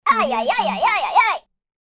cartoon worried shout

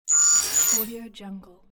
cyborg sound target

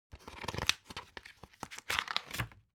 object plastic dvd case remove booklet

